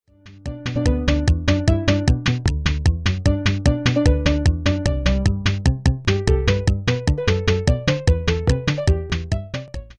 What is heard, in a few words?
noteblock